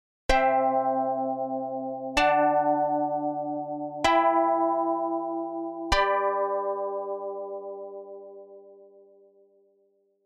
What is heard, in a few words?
Pluck